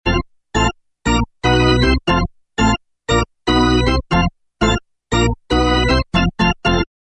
music organ go team go rising chant with hand claps, sports